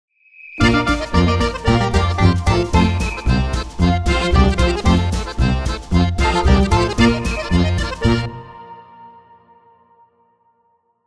Swiss Folk Heritage

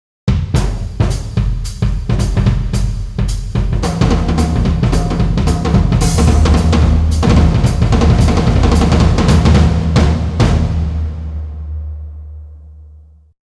Drums; MPhon

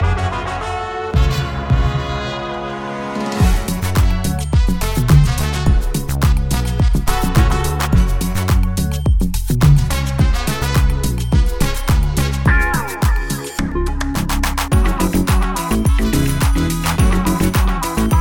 Swinging Bass; MPhonic Rec

